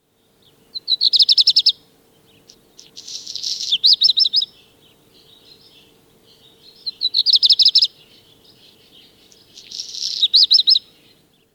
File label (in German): Hausrotschwanz Gesang